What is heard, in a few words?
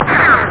Ricochet; Amiga; Arcade; Wingnuts